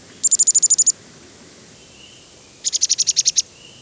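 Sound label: Black-capped Chickadee Chatter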